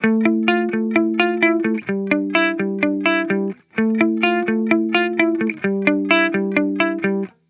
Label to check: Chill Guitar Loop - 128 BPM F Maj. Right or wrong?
Right